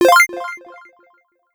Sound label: Arcade bonus alert 8Bit